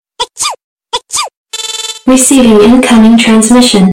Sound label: sneeze; ringtone; starcraft